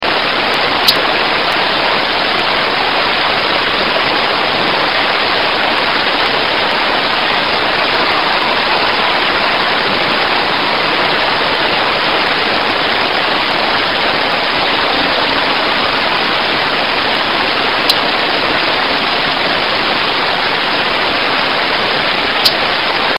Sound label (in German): dunkellaubsaenger